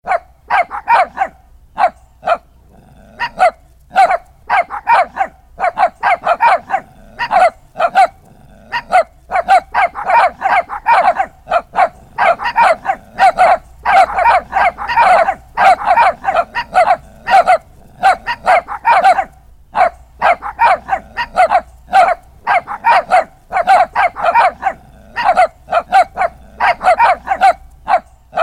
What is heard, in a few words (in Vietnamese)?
Nhạc chuông gâu gâu